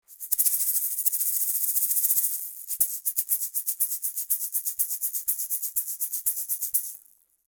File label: Shaker 30cm; Beyerdynamic M 90 Pro X